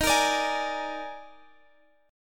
D sharp Major 7th Suspended 4th D sharp, chord

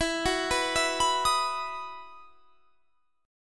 E Major 7th Suspended 2nd; EM7sus2 arpeggiated

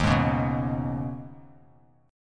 Eb 7th Suspended 4th Sharp 5th, Bass Standard; Eb7sus4#5; strummed; 11,11,11,4; JGuitar

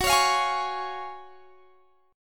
Gb Major 7th Suspended 2nd

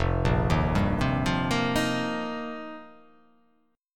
F 9th Suspended 4th F, chord; arpeggiated; JGuitar

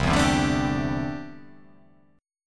C 6th Add 9th C down

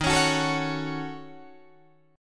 Eb Minor 7th Flat 5th Eb down Guitar Drop D

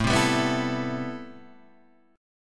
A-Minor 9th A; Am9 Chord {5 3 5 4 5 5} strummed

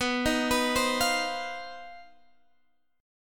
C Minor Major 7th Double Flat 5th B

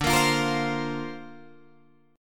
D-Minor 7th Sharp 5th D Guitar DADGAD, chord

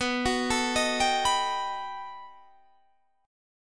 B 7th Flat 13th B, guitar chord

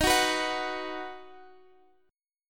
D sharp Minor Sharp 5th D sharp, Guitar Standard; strummed; capo=2

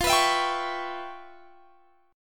E Minor 6th F; Em6/F; strummed

